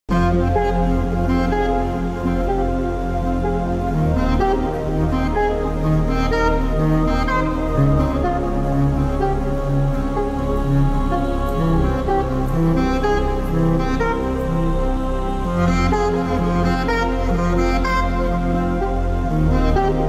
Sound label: edm version remix